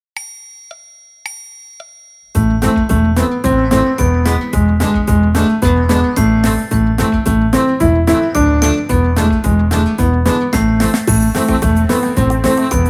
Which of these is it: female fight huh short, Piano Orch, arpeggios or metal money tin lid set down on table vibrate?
Piano Orch